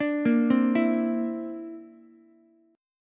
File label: D 7th Suspended 2nd Sharp 5th, Ukulele; D7sus2#5 arpeggiated